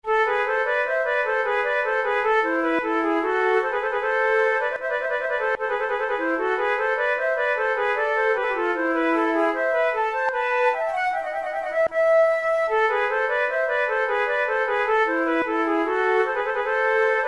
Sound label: concerts for two flutes; monteclair; la rieuse